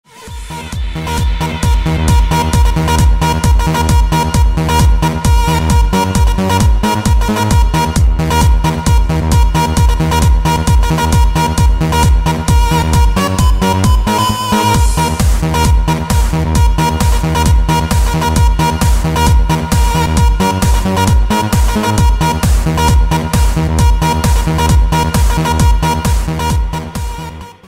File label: House